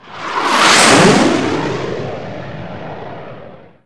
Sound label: jet fly by; killstreak misc